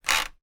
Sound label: household electronic key hotel room door lock or unlock